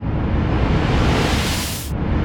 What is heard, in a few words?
damage hits explosions, Warships